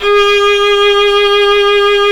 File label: Synclavier Strings; ILIO